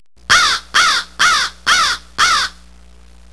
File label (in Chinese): 乌鸦; 动物; 鸟; 特酷声音素材库